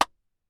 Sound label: Car Interior Impulse Response